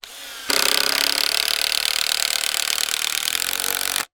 industrial drill cordless hammer action drill screw into wooden sleeper; zapsplat